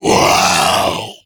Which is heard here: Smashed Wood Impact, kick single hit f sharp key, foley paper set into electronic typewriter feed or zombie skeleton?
zombie skeleton